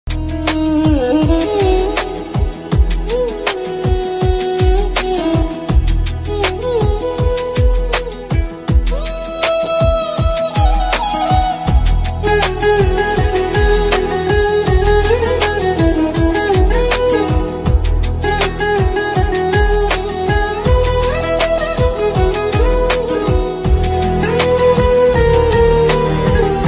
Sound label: bgm, tamil; deepavali; raindrops